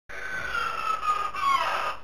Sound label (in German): tuere, Soundeffekte